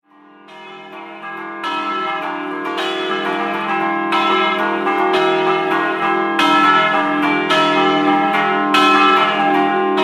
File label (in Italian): RESIUTTA, campane